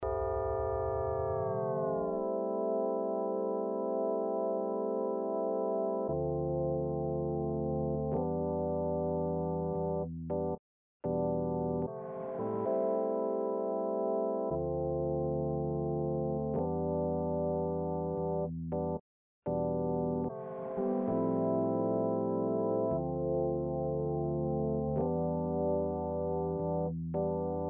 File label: acid beach bop